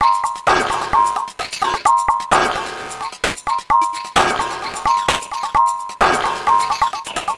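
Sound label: Messup Loop, beats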